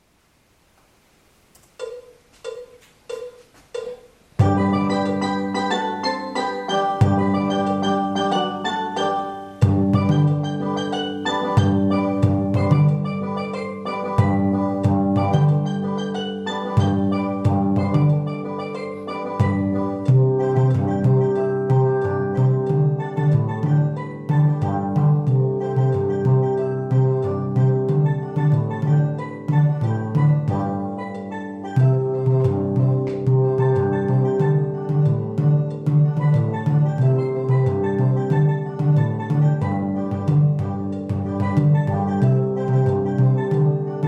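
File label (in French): BASSE, Steel Band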